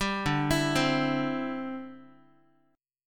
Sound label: D 7th Suspended 2nd Suspended 4th D, Guitar; D7sus2sus4 arpeggiated